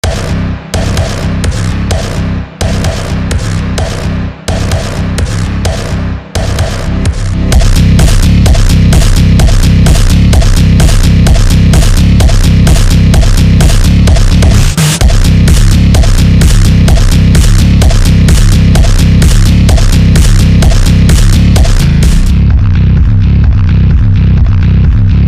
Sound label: slowed